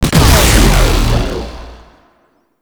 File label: Infested Shotgun Alt Fire Shot; Weapons